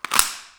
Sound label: cracker; myer